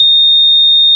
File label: Vibes D6